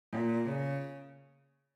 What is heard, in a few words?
Sus Vib HARD Intro; SVC; Factory